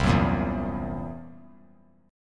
C 7th Flat 5th C down, chord; C7b5 strummed; JGuitar

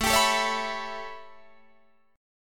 A 9th A, Guitar Open D

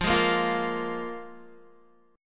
F Minor Double Flat 5th F Guitar; Listen to Fmbb5 strummed

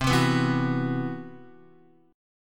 C Major Sharp 11th, Guitar; strummed; CM#11

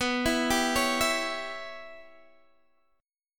Db Diminished B, chord; Dbdim/B; arpeggiated

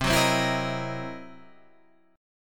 C 7th Sharp 9th Flat 5th, C, down; strummed; chord; Standard; JGuitar

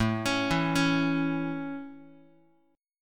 A Major A, Guitar; arpeggiated

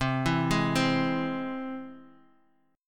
C Augmented down

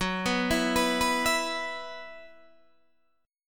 F sharp 7th Suspended 4th, Guitar, DADGAD

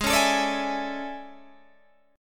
A Major 11th G sharp

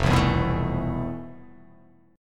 F Major Sharp 11th; strummed; chord; FM#11; 1,3,2,4,4,4,x,x; JGuitar

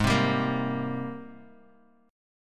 Ab Major 9th Ab, Guitar Open G; 6,5,5,3,x,x; down

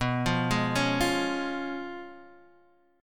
Db 7th Cb; arpeggiated; JGuitar

